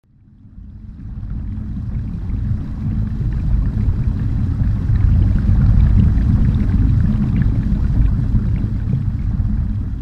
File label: Submarine sails underwater